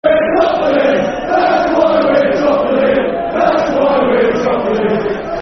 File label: That's Why We're Top Of The League Football Chant (Soccer Song); Watford Wfc; fanchants